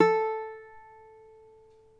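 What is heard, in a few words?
reference pitch uke std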